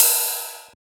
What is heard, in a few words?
Ride; FLPRO